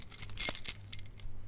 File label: locked; SuperTuxKart